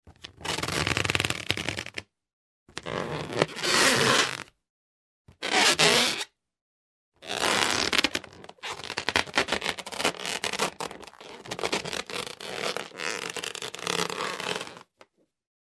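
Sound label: squeak of an air balloon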